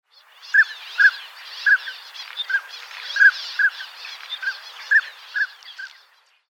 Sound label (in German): Spiessente Anas acuta; Vogelstimmen